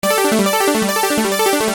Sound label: Arpeggio 140 D, Techno Synth Loops; Sonic Foundry (Sony Creative Software); Signals I